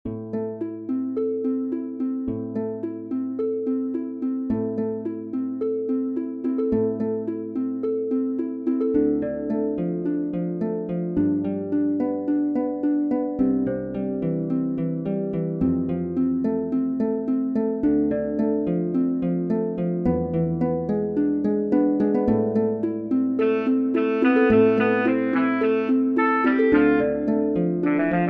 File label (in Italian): Duo Per Sassofono E Arpa, MIDI